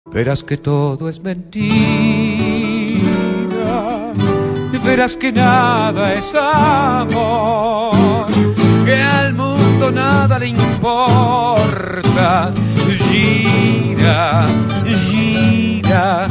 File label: Tango, ringtones